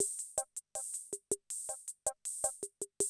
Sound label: CR78, DrumLoops